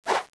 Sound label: slash